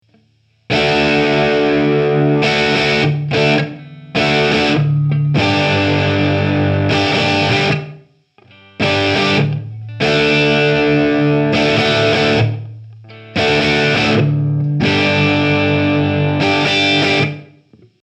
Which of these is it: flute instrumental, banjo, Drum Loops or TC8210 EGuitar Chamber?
TC8210 EGuitar Chamber